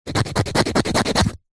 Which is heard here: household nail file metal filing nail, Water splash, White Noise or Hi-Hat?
household nail file metal filing nail